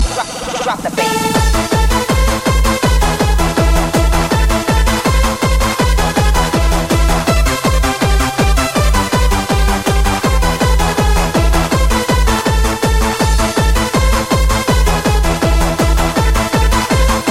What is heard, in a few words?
Music Box